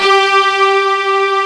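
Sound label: pop strings G4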